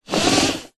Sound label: vehicles car suv nissan patrol 1998 seatbelt pull